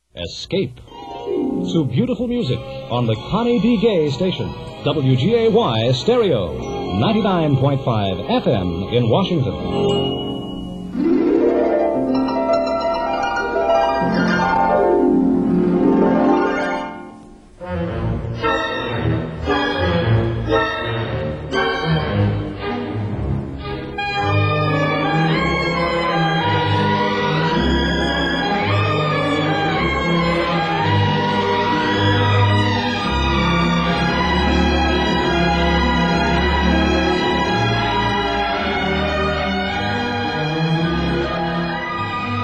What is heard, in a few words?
harp; Bob Chandler; WGAY